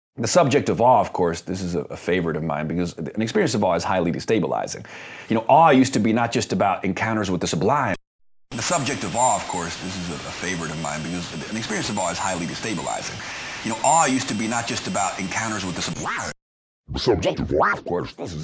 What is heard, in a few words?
vocal presets; nuxx